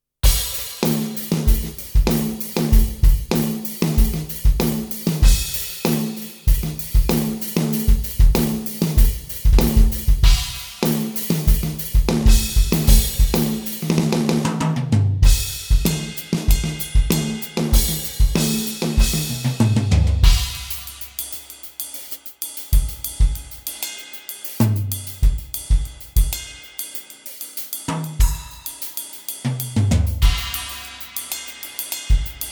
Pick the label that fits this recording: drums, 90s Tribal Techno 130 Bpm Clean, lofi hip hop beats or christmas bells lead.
drums